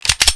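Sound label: m4 click; weapons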